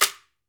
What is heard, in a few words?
AMAZON SHAKER, Latin Shakers